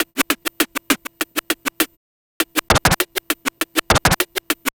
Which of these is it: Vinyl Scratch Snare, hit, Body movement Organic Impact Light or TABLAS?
Vinyl Scratch Snare